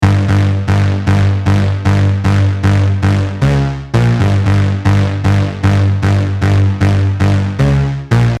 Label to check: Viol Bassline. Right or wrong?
Right